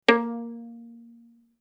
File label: Violin pizz ff sul G Bb3; MIS Pitches - 2014; Strings